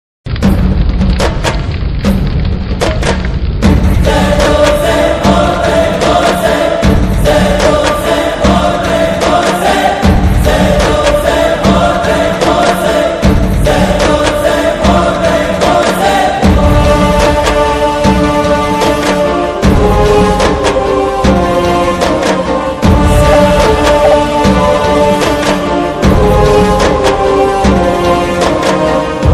mass bgm ringtone movie bgm ringtones telugu bgm ringtones mobile ringtones